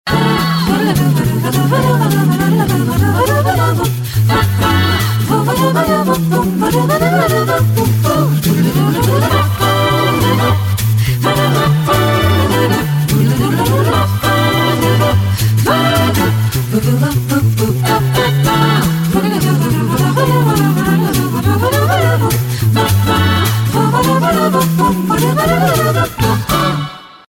jazzy; LG

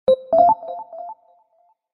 Echo, notifications; Bluboo; S8

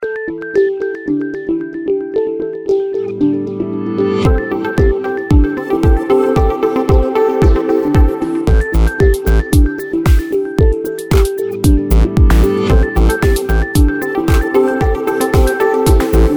Sine Lead; Coolpad; Dazen-X7

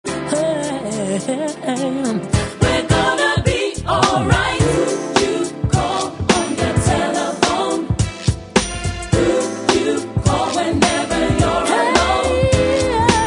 Urban; Motorola; V235 T Mobile

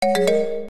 Chinese MMC insert; Nokia; audio themes

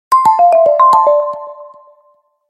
Leap, notifications; Tecno Phantom Pad II (G9)

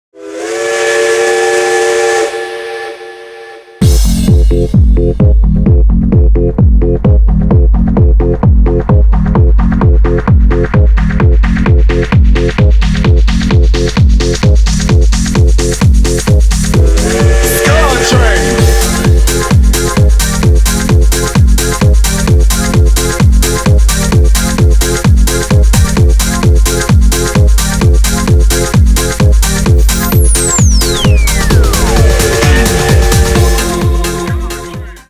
Ska Train, Dance & Hip Hop, ringtones; SKY IM-U160K

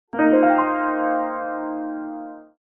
String; China Mobile; A3s

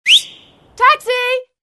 Cab, Nokia Ringtones; Nokia 2760; Southeast Asia

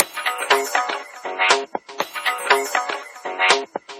Beats; Lenovo; P770; ringtones

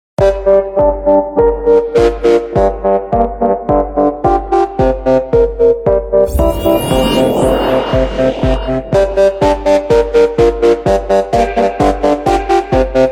ramp, ringtones

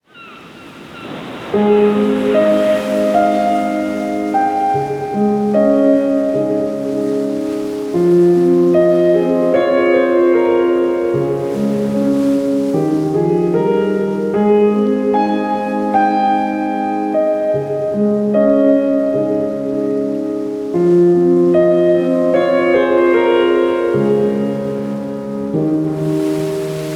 weather alarm default; OnePlus; ui; OnePlus 11

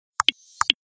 Message, Nokia Alert tones; 6350

